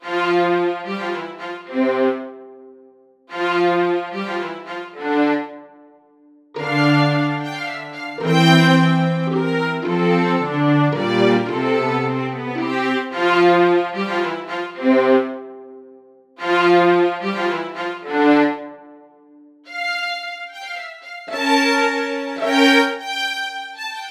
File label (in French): vsl quatuor; motu machfive2